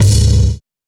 silky kick drum single shot g sharp key, Royality free kick drum sound tuned to the G# note